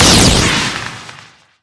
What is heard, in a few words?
Crusher Shoot; Skylanders Cloud Patrol; Arcade, iOS, Mobile